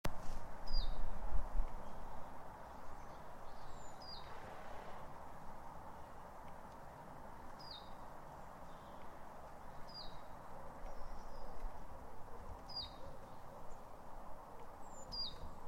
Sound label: sunday afternoon birds, birdsong train hooter and lawn mower